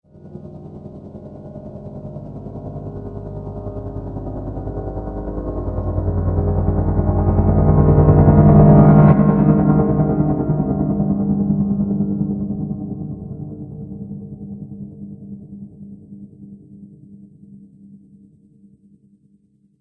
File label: Horror Piano Tremble, Piano Sound Effects